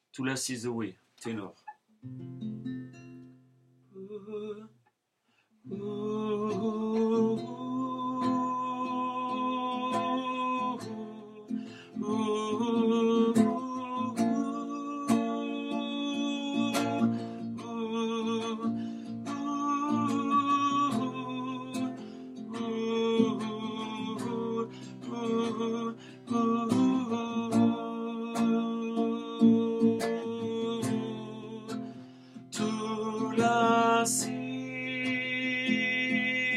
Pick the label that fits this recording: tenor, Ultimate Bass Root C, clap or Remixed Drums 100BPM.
tenor